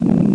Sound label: scraping